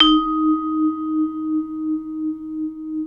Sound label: MLT VIBE